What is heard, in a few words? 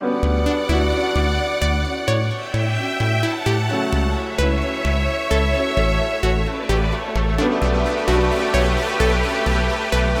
ELECTRO ROCK SONG